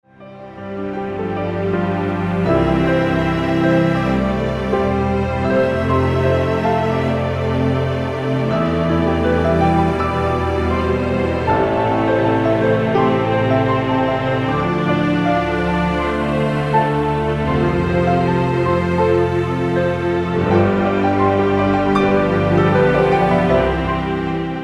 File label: Beautiful Mother, INSTRUMENTAL